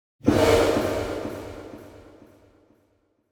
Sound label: Impact Drop Distant Shock Hit; Hollywood Edge; sound designer's toolkit v2